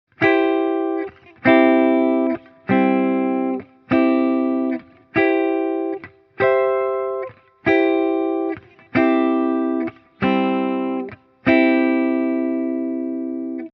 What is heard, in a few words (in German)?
C Dur mit Umkehrungen